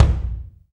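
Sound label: bass drum, BD Real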